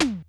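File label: Electronic High Tom, Yamaha DD-35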